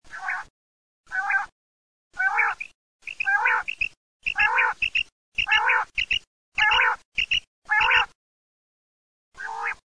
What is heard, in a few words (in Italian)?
quaglia